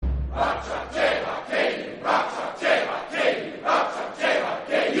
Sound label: rock chalk ku